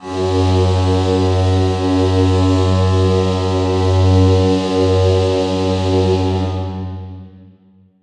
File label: Hockey Goal, Hockey Horns & Buzzers; SFX Kit; SanJose